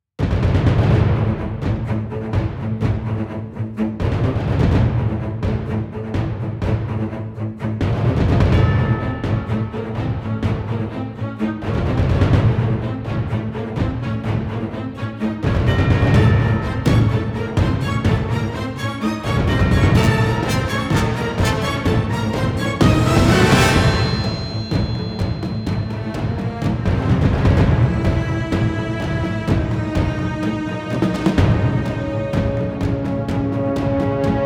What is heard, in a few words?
Under Attack; The Dwarf Run